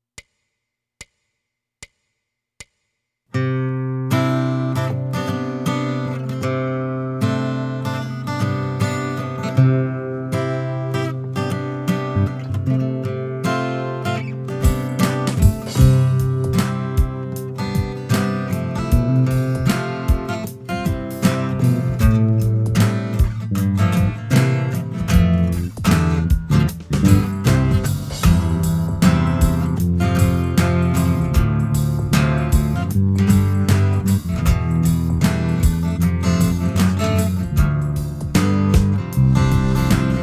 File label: no vocal; hymns; Jesus Loves Me This I Know